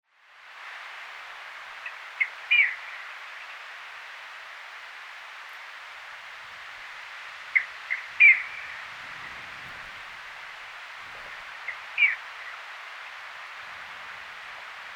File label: Solitary Snipe Gallinago solitaria, Ladakh